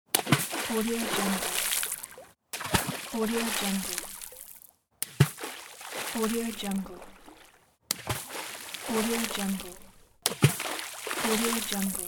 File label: water splashes